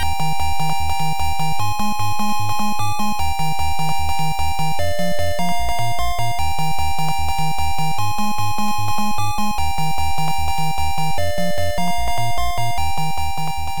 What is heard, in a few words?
Last Hidden Door Open BGM; Mighty Bomb Jack; NES